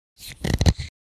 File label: Bottle cork closing